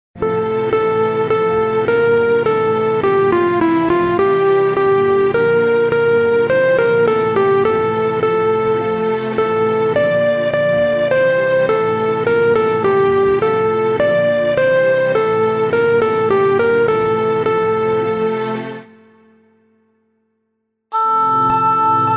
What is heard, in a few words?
keyboard